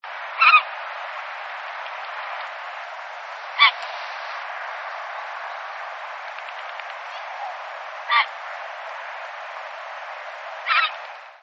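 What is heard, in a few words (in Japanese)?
aosagi, grey heron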